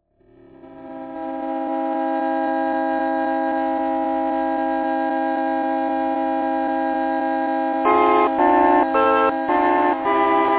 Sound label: horn song